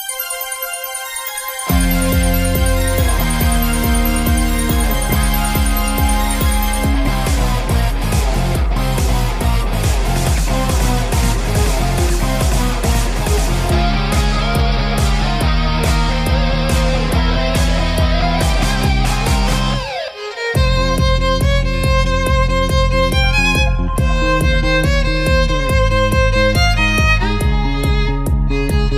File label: Dubstep